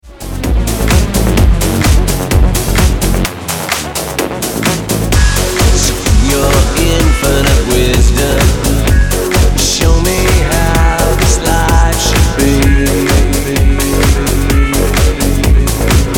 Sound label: club dub